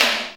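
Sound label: snare drum; E-Lab; X Static Goldmine 1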